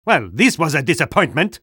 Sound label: spy jeers